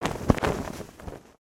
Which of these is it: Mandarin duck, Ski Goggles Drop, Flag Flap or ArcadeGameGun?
Flag Flap